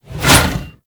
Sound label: Scythe Whoosh; Warframe; Weapons; Stalker Scythe